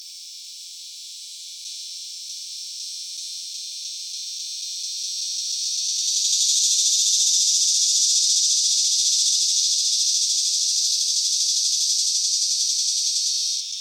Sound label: Megatibicen figuratus filtered, cicadas